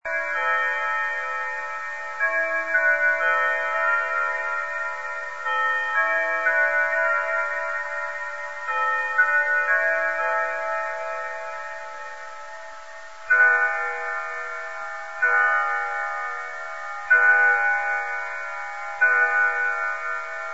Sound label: chimes